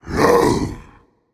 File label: battlecry; smite; anhur